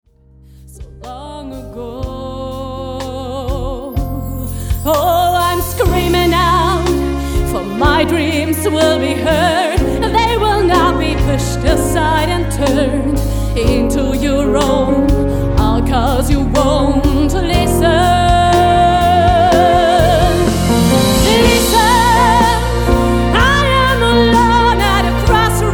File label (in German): Quintett; band muenchen musik